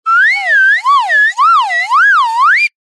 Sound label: cartoon slide whistle ascend climb wobble